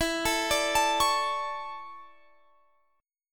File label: D Major 7th Suspended 2nd E